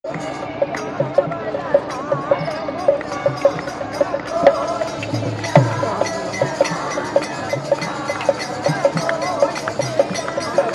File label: varanasi chanting